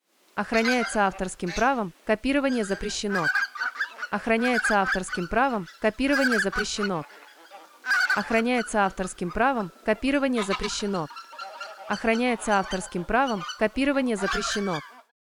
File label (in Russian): белолобые серые, смешанный базар